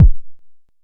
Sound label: analog deep, Kickbank; Ableton Foundation FreePacks